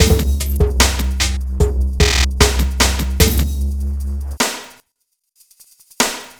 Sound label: Beat, Futurist Drum & Bass; Drums & Percussion; Beats